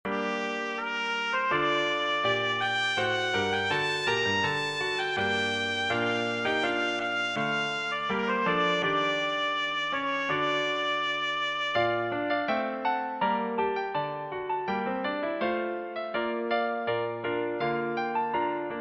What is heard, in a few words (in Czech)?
trubka; Přednesová skladba pro trubku; g moll; Sonáta A 4 g moll - trubka; p j vejvanovsky